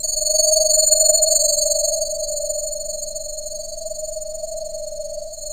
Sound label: ring mod; Zero-G Ambient 1; RING MOD #2